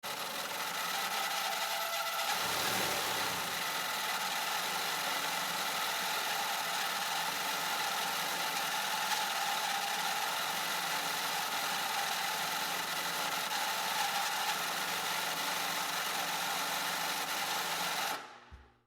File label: sewing machine squeal